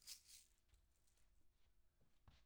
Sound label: PERC OH M